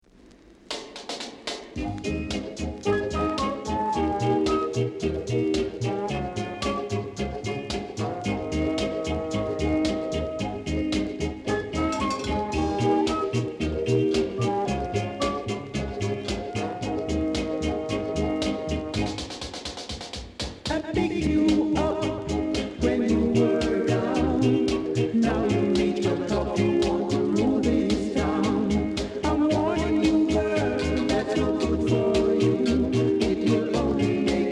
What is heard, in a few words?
groove